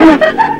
FX, Futurist Drum & Bass

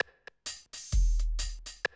130, Classic DrumLoops

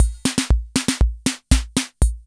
FILL, KR55; INIS - Funky Xtreams; KR55 LP2

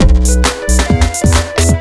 2 Step 133 MIX, House

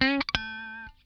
lick scale, funk guitar; Zero G - Funk Guitar

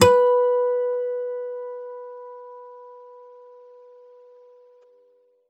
STEEL HARM B, GUITARS; Synclavier Sampler Library

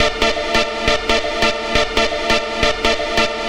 NRG Lead, trance

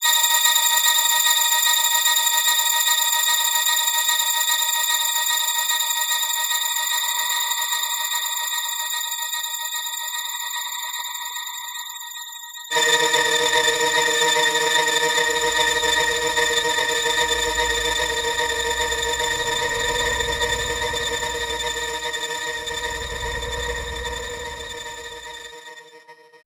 Nuclear Freeze D#, Ambient; Zero-G Seismic Frequencies; 90 s Sample CDs

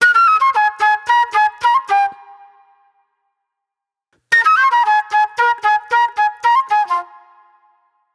Raw Funk Flute

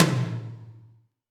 Tom, Alesis D4

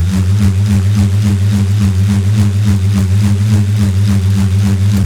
bull, bullroarer, primitive instruments

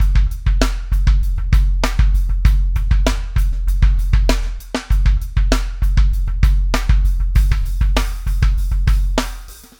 SHRED, Backbeat

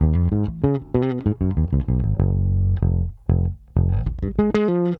Bass, 70s Analog Funk

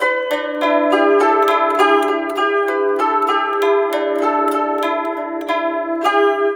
SITUR, SITUR PH; Spectrasonics; Heart of Asia

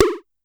Pollard Syndrum

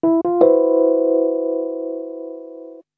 D&B Keys Riff; neuro

